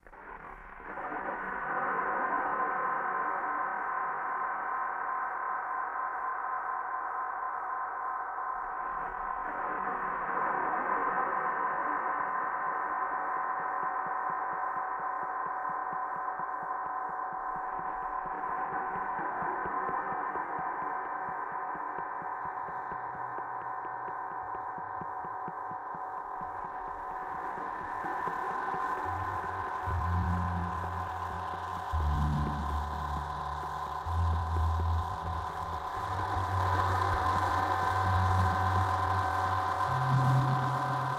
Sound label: Ambient Animal ruidos de sable